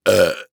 Human Burp

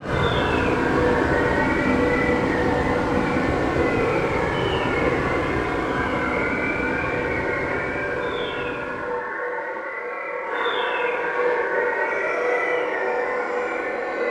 Distortion Windy Spooky Voices; Adobe; Production Element; Imaging

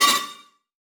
Multimedia Internet CD-Rom Flash Blast

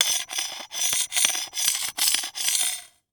Horror Scary Knife Metal Scraping Copper

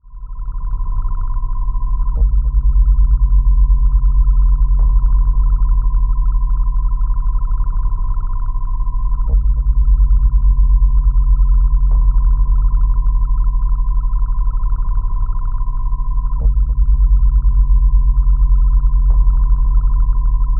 Drone Bed Reverse Hits; Production Element Imaging; AdobeCC

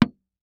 Sports Tennis Ball Single Bounce On Wood

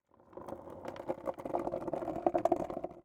Skateboard Board Pushing Through Rock Salt; Sports

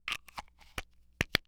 Household Bottle Close Prescription

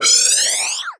Multimedia Internet CD-Rom Flash Whoosh

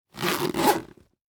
Household Bag Unzip Duffle

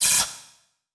Title Transition Laser Short; Production Element

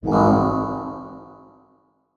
Flash Blurp; Multimedia Internet CD-Rom Flash

